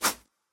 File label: knife; STALZONE